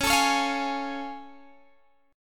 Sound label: A Augmented Major 7th C sharp